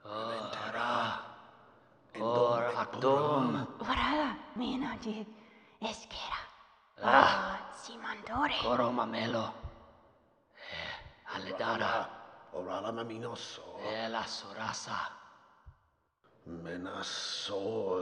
whispers reverb; Snails

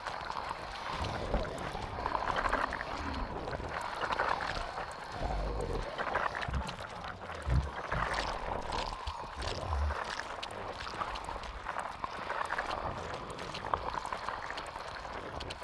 swamp duct sucker sucking loop

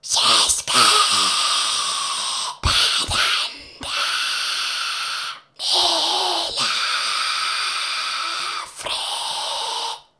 Huntress sing; Hollow Knight: Silksong

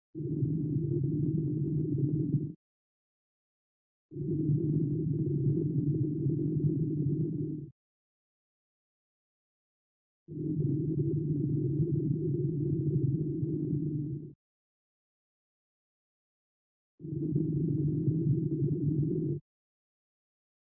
peak creature crooning loop vibration

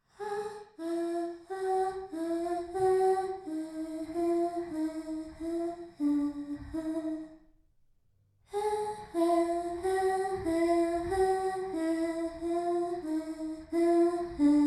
pre battle hum

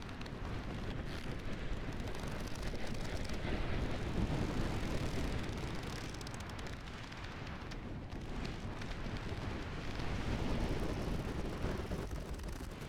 abyss tendril positional loop; Hollow Knight: Silksong

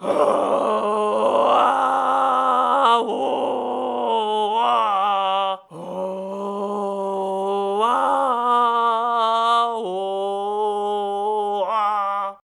sing; Hollow Knight: Silksong

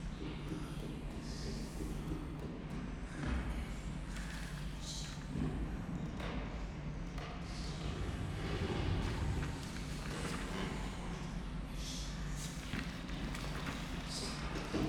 atmos library; atmos library v2

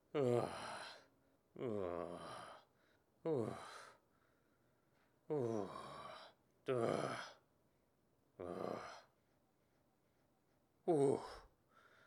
extra fake death loop

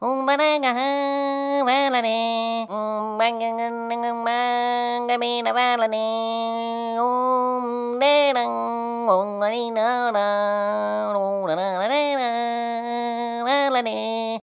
Courier sing

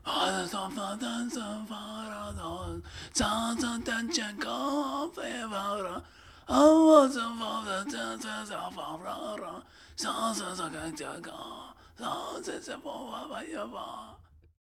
Pilgrim Groveller Pray Sing; Hollow Knight: Silksong

